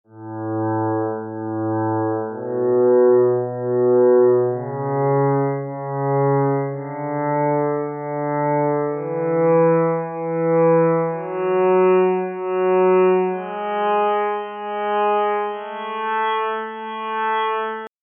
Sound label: cello